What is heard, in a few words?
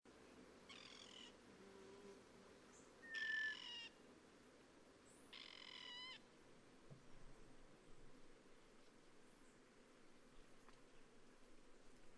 Dactylortyx thoracicus